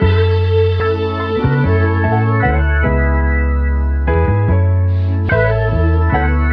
keys and voice heeeeee, Phonky loops

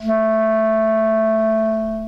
clarinet; Winds

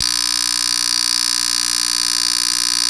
buzz

